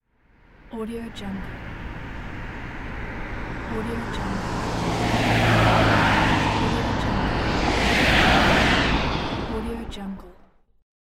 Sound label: car driving by